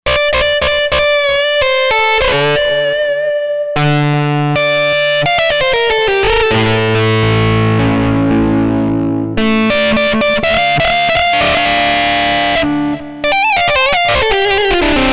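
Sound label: Guitar Black Magic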